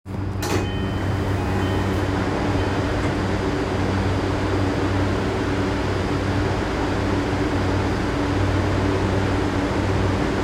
東急田園都市線池尻大橋北, train sounds

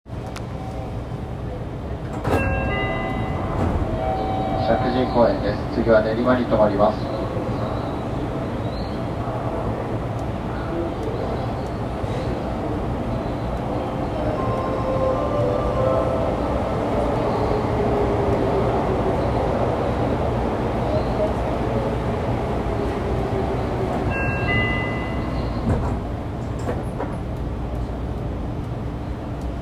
西武池袋線石神井公園東

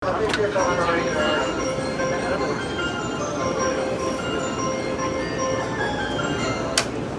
JR中央・総武線御茶ノ水西, train sounds

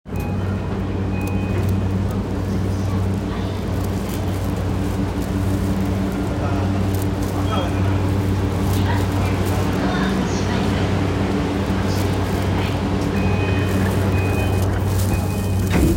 JR 山手線 駒込 CCW, train sounds

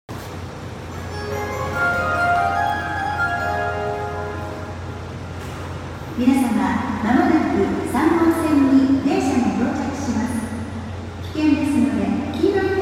阪急宝塚線宝塚北接近, train sounds

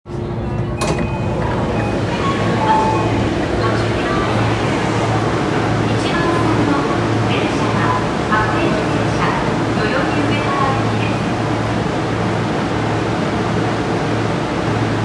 千代田線乃木坂西

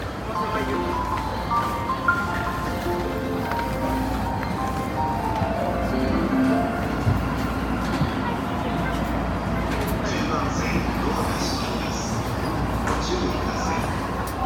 湘南新宿線 横浜 北, train sounds

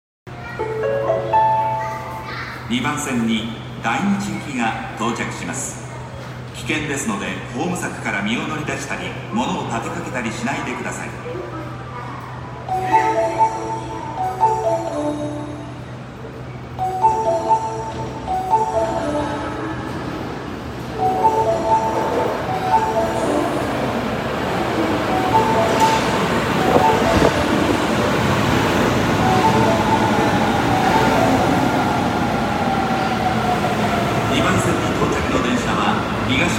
大阪メトロ谷町線谷町四丁目北接近

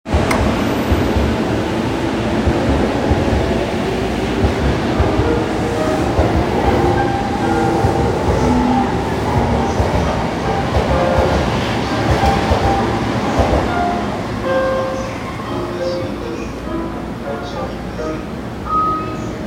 JR中央線快速阿佐ヶ谷東, train sounds

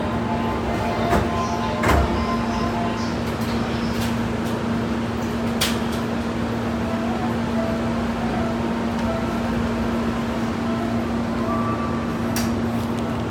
京浜東北線上中里北, train sounds

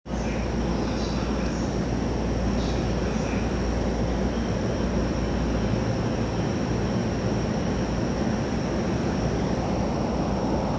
JR東海道線国府津北

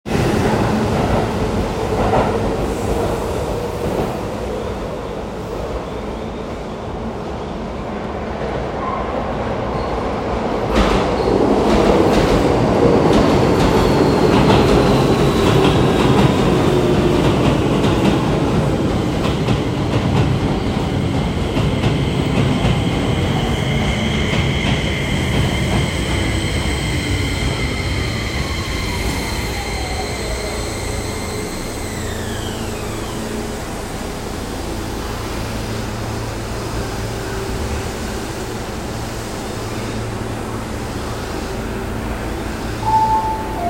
京王線聖蹟桜ヶ丘東接近

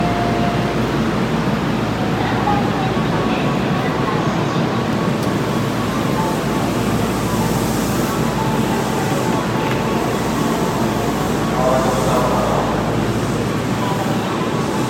阪神三宮東, train sounds

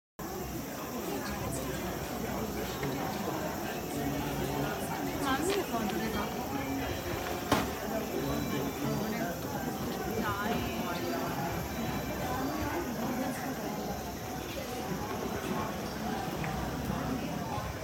JR奈良線稲荷北, train sounds

